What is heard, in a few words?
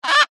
animals bird parrot black cockatoo squawk single; zapsplat